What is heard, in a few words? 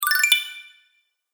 Boost Health Game Fx, video game